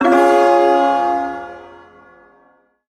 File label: Ersatz Shawzin Phrygian Chord F; Music; Chords; Warframe; Duviri